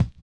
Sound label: HI RIDER; Spectrasonics; Metamorphosis